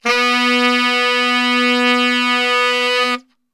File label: B 1 SAX STR